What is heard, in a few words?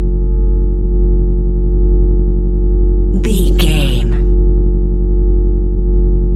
hum electric neon light loop